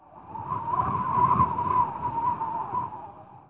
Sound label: WIND, wind; zones